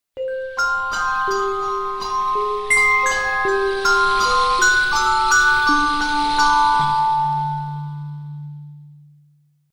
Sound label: Scifi Audio Logo Ident Tribal Bells Metallic Sweep; Strange Tone